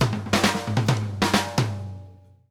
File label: Drumworks SP fills 132; Sampleheads; New York City Drumworks VOL-1